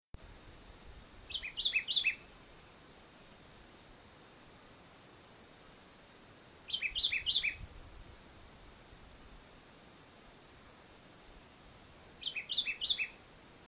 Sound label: Vireo modestus Barbecue Bottom Jamaica; West Indian